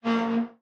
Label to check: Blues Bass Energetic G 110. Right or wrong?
Wrong